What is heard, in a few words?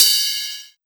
Ride, Cymbals